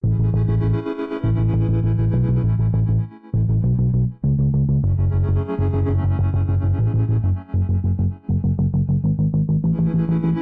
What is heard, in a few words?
Music Tension